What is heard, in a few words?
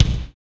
kicks